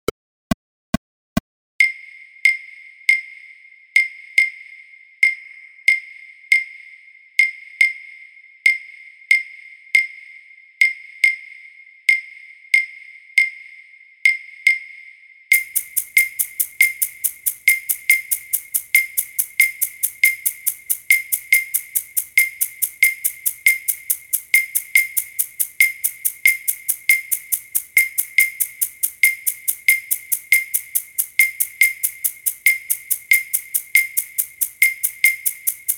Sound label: perc click; orchestra